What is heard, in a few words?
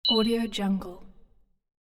interface click bell single; interface sounds; تک کلیک با صدای زنگ